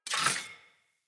Dagger Equip; Weapons; Player; Swords; Fey

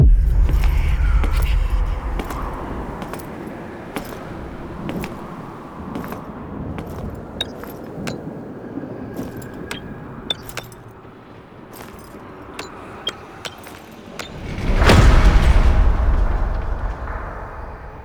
Epilogue Cinematic SFX; Warframe; NineteenNinetyNine; Stage12PartyOfALifetime

